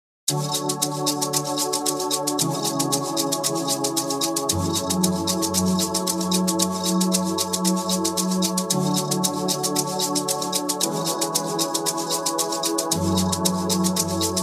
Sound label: Amapiano, Type Beat Instrumental